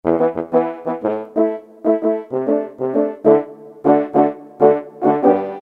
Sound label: FR.HORN; Orchestral Winds; Roland SN-U110-06